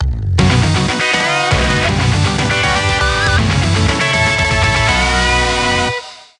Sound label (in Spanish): Tema de victoria; Bowser y Bowsy; SSB4